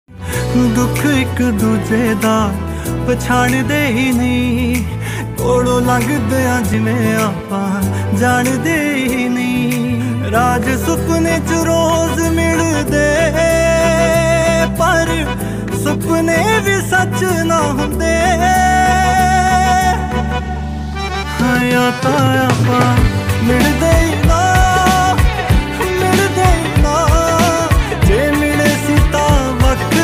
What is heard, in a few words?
New Punjabi Ringtone